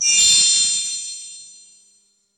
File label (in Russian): zvezda mertsaet tonkiy perezvon; kosmos